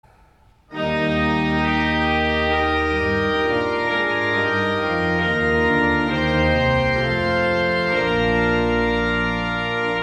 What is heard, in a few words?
Organ; Johann Sebastian Bach; Wachet auf; St. John's Cathedral, Jacksonville Florida